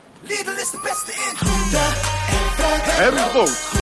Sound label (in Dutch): groente fruit en brood zang